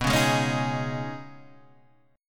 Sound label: D sharp 7th Suspended 4th Sharp 5th